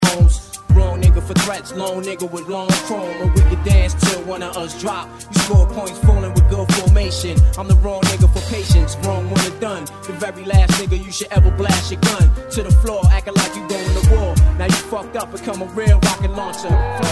piano again

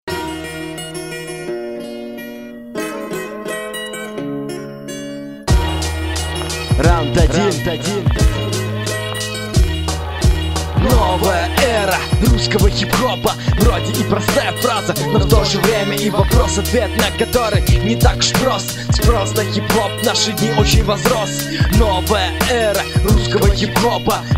new age russian hip-hop

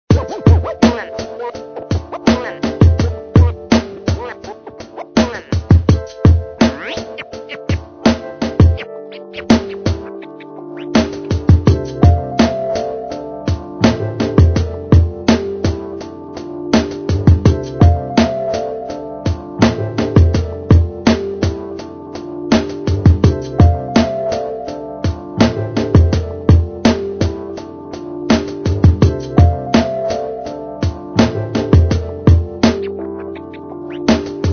instrumental

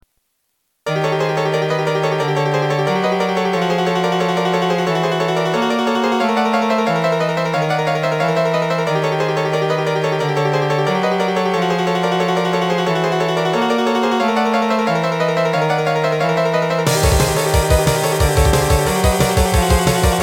suspense

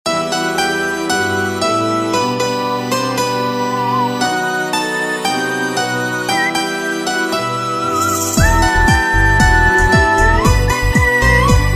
Ukrainian folk beat; krinichenxka

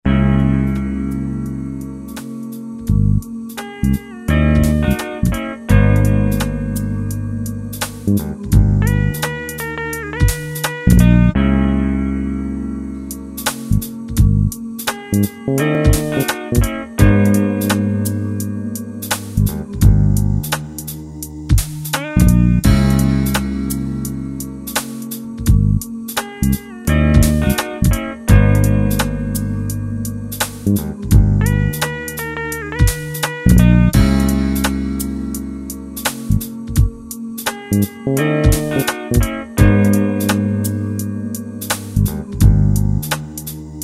instrumental

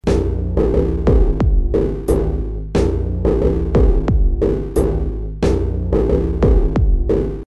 loop

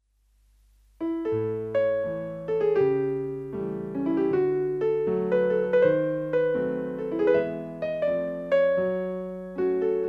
jazz standart

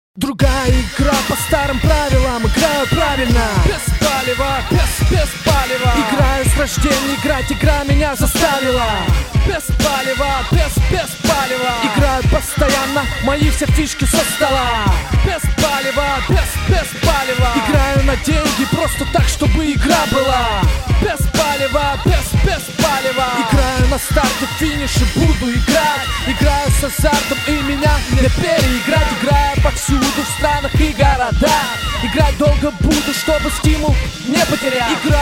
micro streetbeat

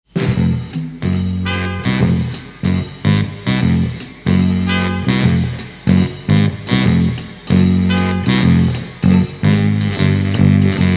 swinging

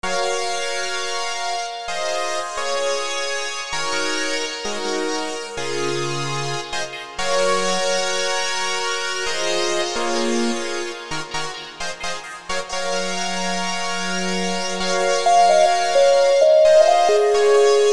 rnb instrumental

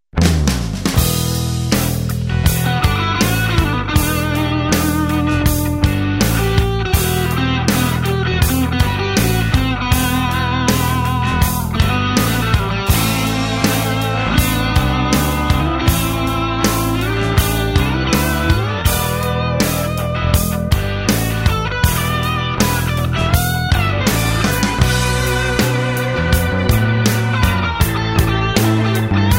bass

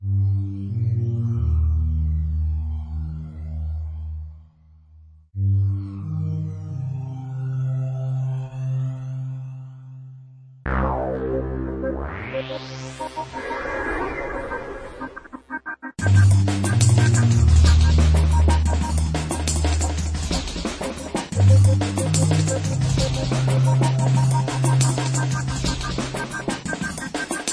drum

